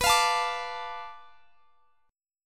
B Minor 7th Flat 5th B Guitar Standard; Bm7b5; strummed; x,x,21,22,22,22; JGuitar

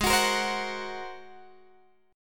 G sharp 9th, Guitar, Open G

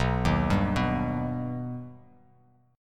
B Augmented B, chord

